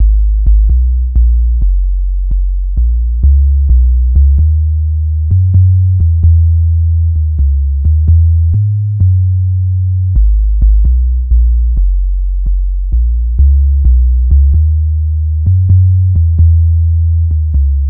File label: bass, low end music